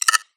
xen infest click; Half Life Alyx

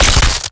spark short

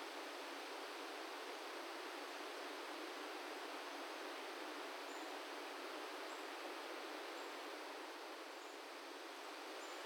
tree sway wind; ambient

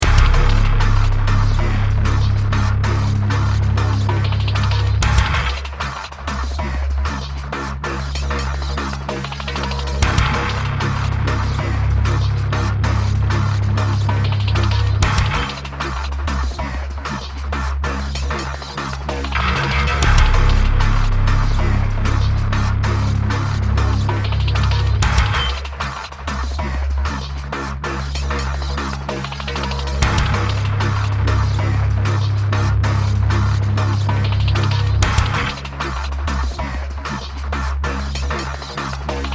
bomb planted, music

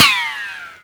ricochet, bullet impacts; Half Life Model Pack

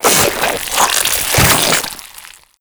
juicing, antlion; Half Life Alyx

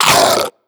choke, zombie vox; creatures

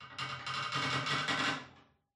wood creaks; Half Life Alyx

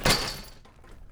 metal chainlink, footsteps; player

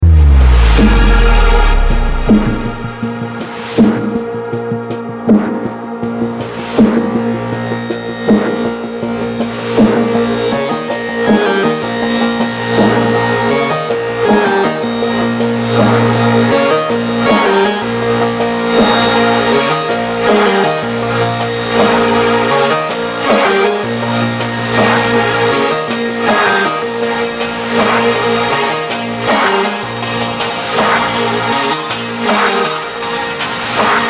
bomb planted

